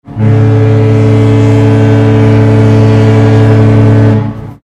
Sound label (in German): Mein Schiff typhon klingelton, kreuzfahrtschiff